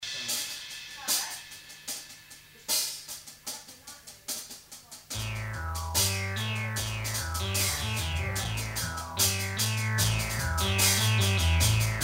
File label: RIFF